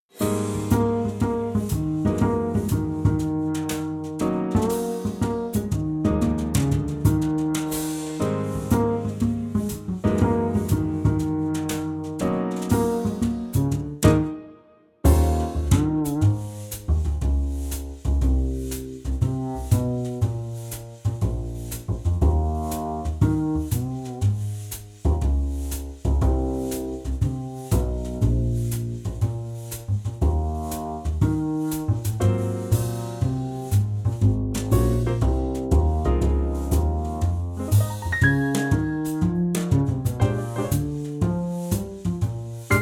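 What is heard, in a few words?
Ab, backing track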